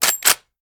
reload end break, weapons; gmod; viper

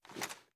kilo98 inspect; viper

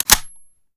ar xmag reload empty fast bolt release, weapons; gmod; viper

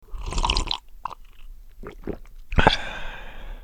drinking; gmod; perp2.5

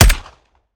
sup plr; weapons; gmod; viper; scharlie

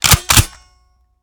reload empty end chamber; gmod

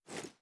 reload empty arm up, ar; weapons; viper

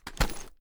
reload empty fast end, reloads; gmod

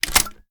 reload empty open, sh; gmod; wfoly plr sh charlie725

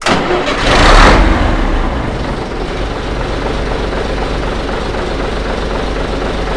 garbage truck start loop; gmod; sligwolf

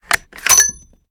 reload empty eject; reloads; gmod

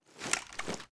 ar xmag reload empty rotate, weapons; gmod; viper

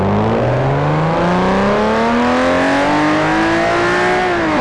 first, vehicles

